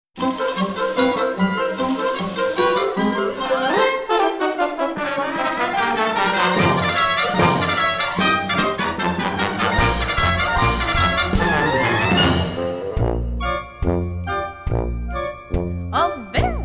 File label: Guided Vocal